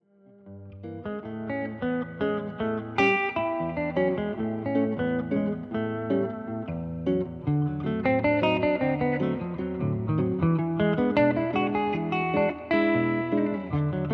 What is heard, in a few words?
two guitars